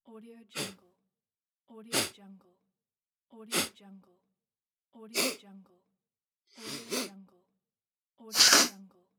sniffing pack

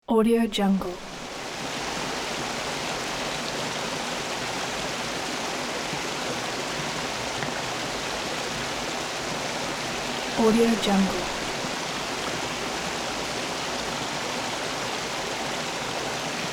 river flowing

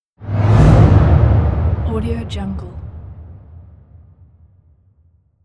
long reverberant whoosh

